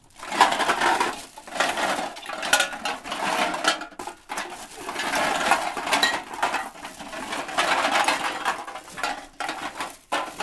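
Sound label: cans in garbage bag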